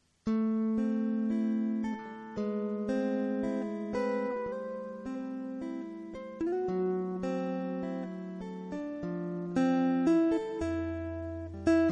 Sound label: Steves Stairs